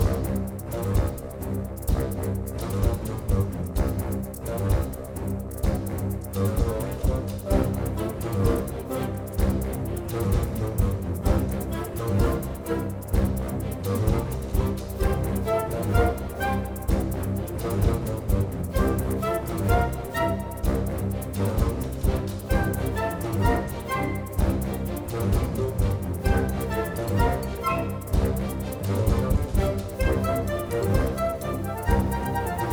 Music Neutral Theme Bpm128 Loop; asterix obelix slap them all 2

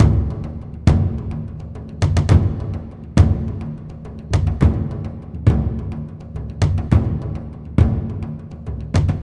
Indian Drums; Blair Witch Volume I: Rustin Parr

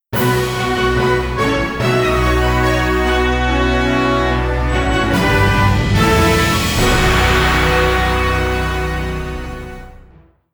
Tourney End Music

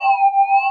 KEY Hold Loop

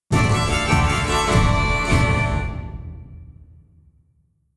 Combat Out; The Witcher 3 Wild Hunt; No Man's Land

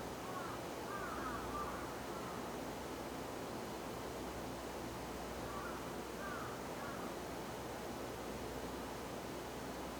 Dorm Lobby; Tokimeki Idol

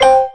KEY Good Hit

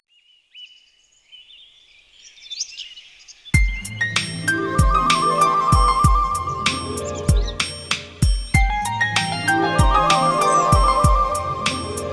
Nature sfx; Scrabble

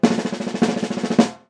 prize idle drum; Prizes

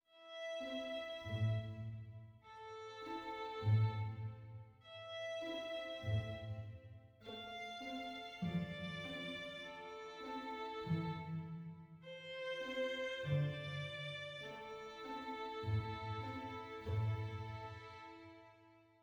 adventure soft

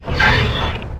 door; Shin Megami Tensei IV Apocalypse; 3DS

